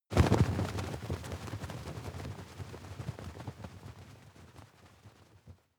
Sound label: fly movement flutter, comedy cartoon; soundpool